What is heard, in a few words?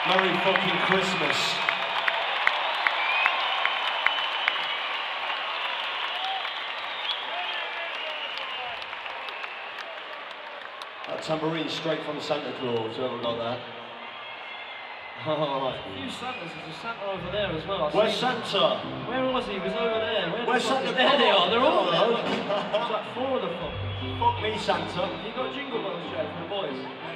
Jingle Bells; Motorpoint Arena Sheffield